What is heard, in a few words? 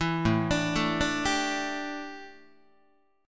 A 7th Suspended 4th, A, down, Guitar